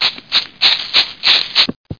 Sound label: Chimp Enters